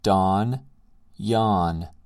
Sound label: dawn yawn